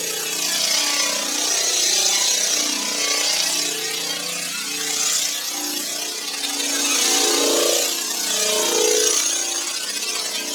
Electrical Medium Loop; Warframe; Ambience; Sentient Devourer

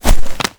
Push Foley; Glyph Game Drifter; Warframe; Duviri; Glyph Puzzle

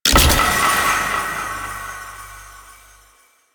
Figurine Pickup; Duviri

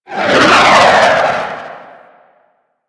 Plunge Ability Angry Proj By; Enemies; Abilities; Warframe; Duviri; Duviri Dax; Dax Knight

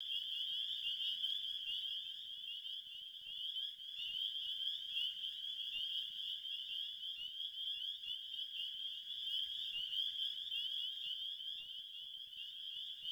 Frog Loop; Ambience

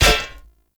Glyph Puzzle Disc Land; Warframe; Duviri

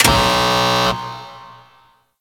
Ocean Depressurize Light On; Ambience; Warframe; Grineer Ocean

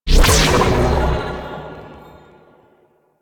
New Mind Control Cast; Warframe; Nyx; Nyx Updated Sounds

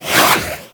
Boomerang Whoosh

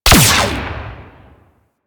Cluster Shot Fire; Enemies; Abilities; Warframe; Teralyst; Bigalyst

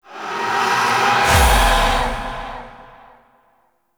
Weapon Void Mode Start; Warframe; Weapons; Duviri; Incarnon Adapters; Incarnon Void Switch

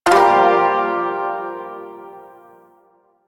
Shawzin Phrygian Chord J, Music; Chords

